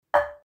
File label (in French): re grave, notes pentatoniques